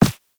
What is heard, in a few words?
Run Default, Footsteps; Warframe; Operator